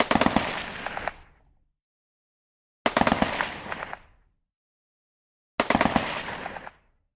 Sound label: AK47 Various Bursts